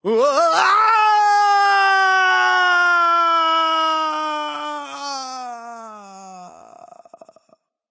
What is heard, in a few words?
nv fear, vox; smite; voicepack; vulcan